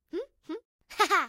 upgrade action; talking tom gold run gamerip 2016; Angela

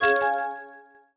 Found Cash; fashion story

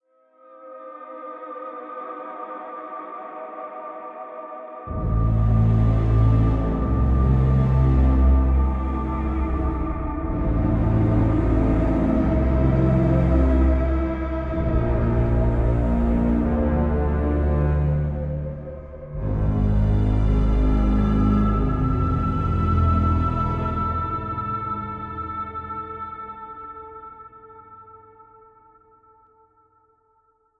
Infirmary Music

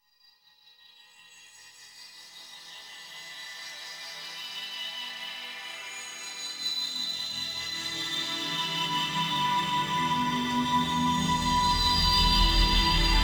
Ambient glass orbit in

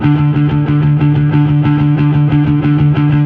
Fuzz D harm

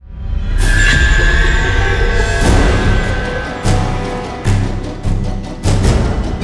Jump Scare Intro; We Happy Few

